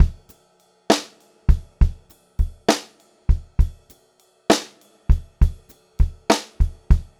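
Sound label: variation, pop-rock drumloops; USB Soundscan vol.37; AKAI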